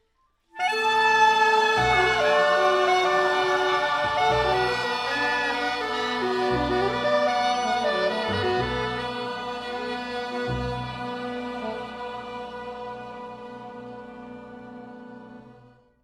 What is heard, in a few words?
blues